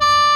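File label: sheng C#3; AKAI S6000 CD-ROM - Volume 1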